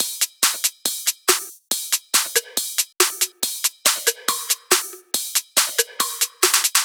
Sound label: drum Loop 140BPM Full